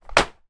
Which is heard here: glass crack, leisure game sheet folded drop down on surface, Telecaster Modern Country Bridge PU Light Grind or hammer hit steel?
leisure game sheet folded drop down on surface